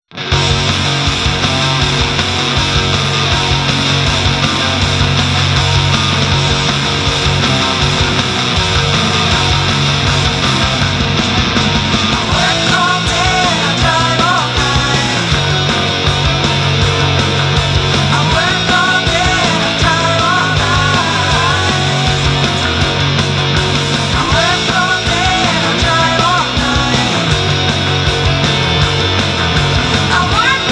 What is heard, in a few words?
Cassette Music